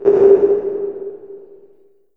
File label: LH HC808 -R, REVRB TR 9+8 LARGEHALL808